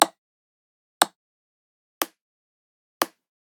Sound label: Generic Click Wood Medium; boom library; The Interface